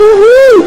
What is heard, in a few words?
uhuuuuu